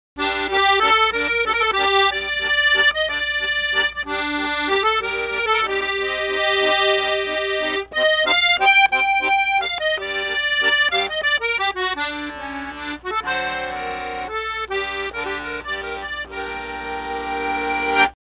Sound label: Musette, accordion